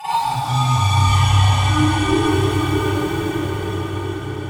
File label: Meditate Cave Fantasy Night